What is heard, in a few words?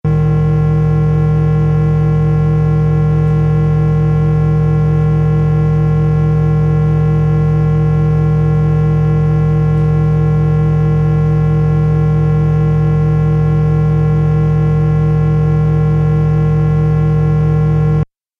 Error Drone Sound; sound design